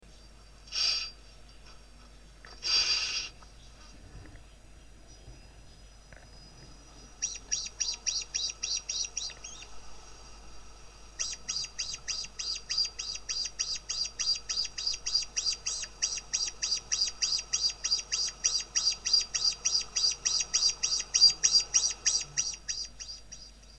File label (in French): autruche, oiseaux